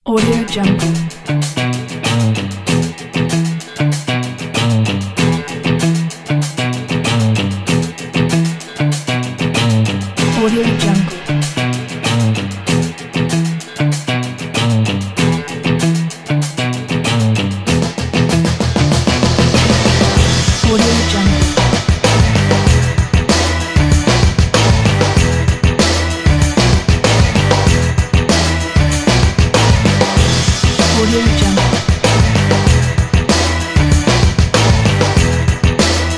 funk rock; oceans eleven; alpinsound

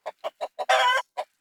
poultry chicken; soundbits-soundfx

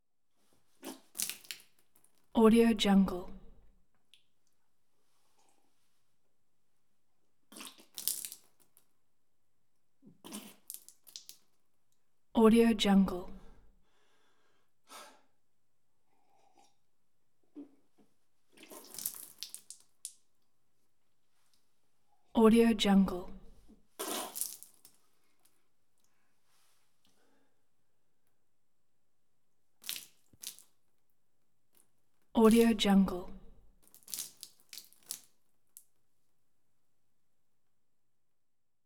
vomit blood splash on floor

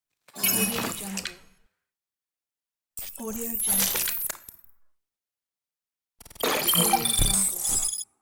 hud glitch

